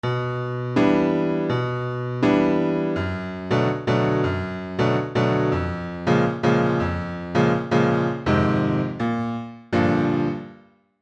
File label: Jazz Chill